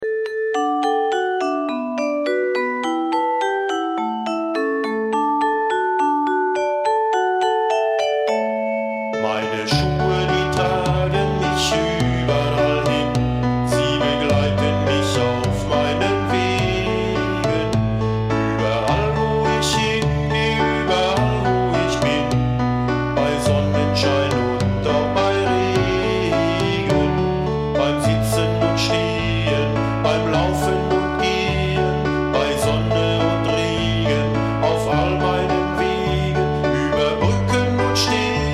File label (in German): kinderlieder vocal